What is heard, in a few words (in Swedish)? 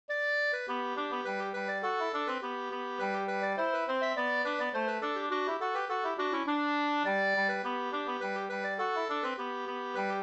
polska, 2st; Byss-Kalle